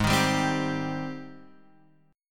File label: G sharp Major 7th G sharp